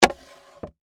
Skateboard Land, Sports, Skateboard, Land, Wood, Surface; Slide; Skateboarding